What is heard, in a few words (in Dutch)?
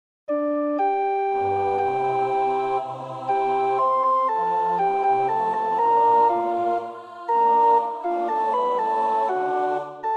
sopraan, koorpartij oefening; Schütz (Heinrich); Matthäus Passion SWV 479